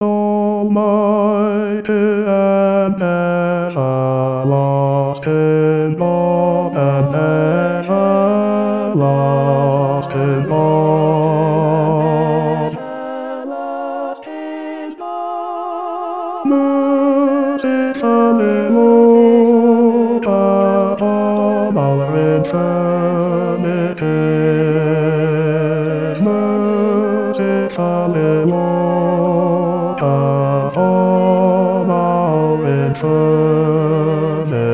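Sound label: bass; Gibbons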